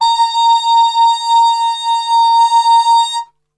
A#3, Brass, TRP CUP MUTE; Best Service; Brass Super Section; 90 s Sample CDs

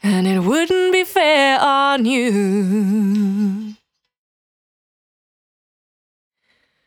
Cry 68bpm B, Vocal; Zero-G

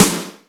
Rock M, Yamaha DTXpress Acoustic Snare

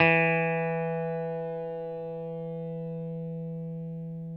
6STR BASS, E; Sampleheads; Whole Lotta Country 1 & 2

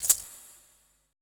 E SHAKER, ETH PERC

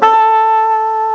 OBOE, REEDS; CMI Sound Library Rev 1.3

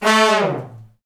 BRS, Fat Falls; Roland L-CD702; Fat Section

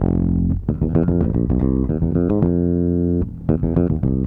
Raw Funk Bass; Sonic Foundry (Sony Creative Software); What it Is! 70s Analog Funk

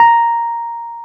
Wurly; Keyboards of The 60's and 70's

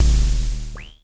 Klone Dual Percussion Synthesiser

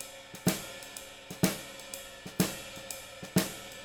SWING, Living Drums; Sampleheads; Peter Erskine Living Drums

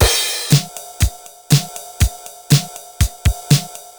TR 505 (120BPM), beats; musicradar; cyborg beats

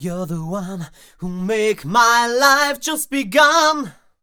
m 128 e-flat, Vocals

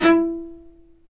Low Marcato; Roland L-CD702; Combos 1

